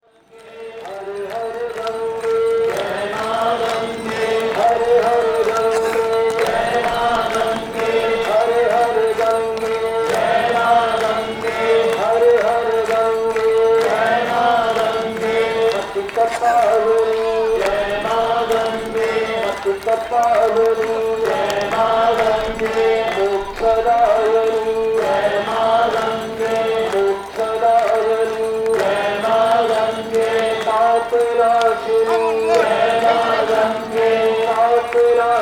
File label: Ganga Aarti Ceremony Haridwar, Religious Music; Samuel Corwin; Selected Field Recordings from India and Nepal Volume II Religious Music